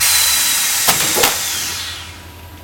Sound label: Fizzling